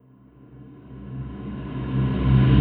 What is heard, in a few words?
reverse, vocal sample; Slate Digital Omnivox Vocal Sample Pack